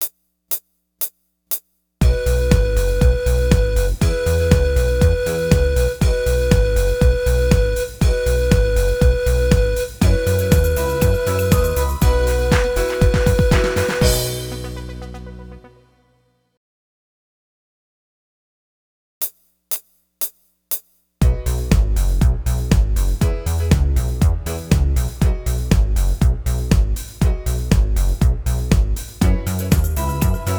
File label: exercise on b whole notes